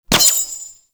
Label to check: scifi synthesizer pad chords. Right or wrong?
Wrong